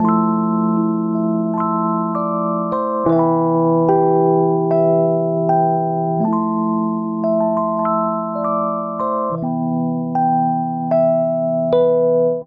Progression Melody & Chords I - vi - IV - I C Major 77 bpm, Electric Keyboards; Ableton; Factory Packs